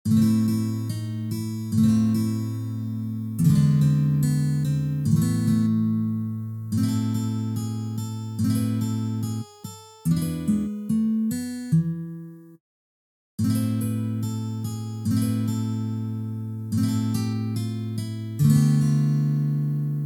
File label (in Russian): na gitare; Murka